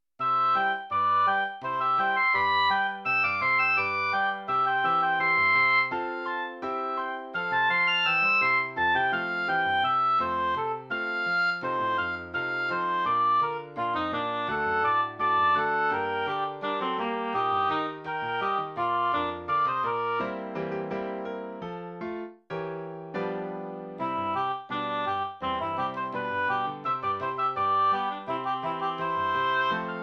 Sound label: A Reed Blown Clock; oboe duets